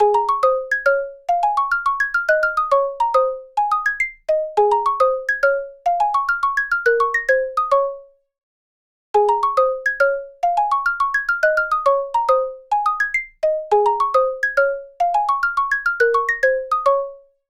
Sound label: Jungle Gym, ringtones